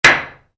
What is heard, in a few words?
Hammer nail, Hammer: Impacts Nail; Hammers; Tools; Stuart Duffield